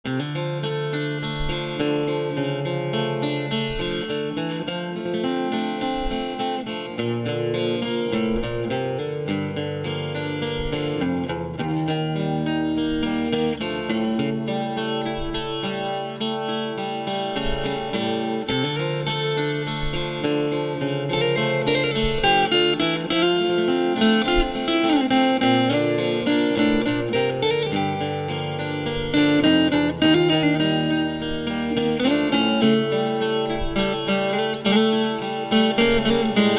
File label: instrumental